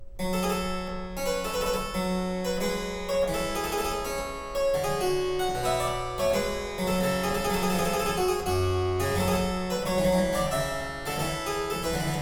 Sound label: Saraband, Harpsichord; G Minor; Purcell edition; Suite No.2 In G Minor, Z661